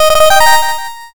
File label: fanfare